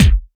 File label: clicky kickdrum g key, Royality free bass drum sound tuned to the G note. Loudest frequency: 717Hz